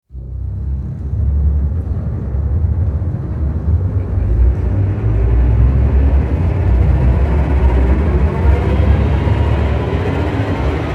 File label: rise